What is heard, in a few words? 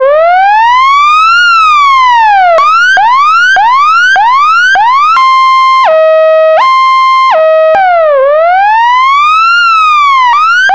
emergency piercer siren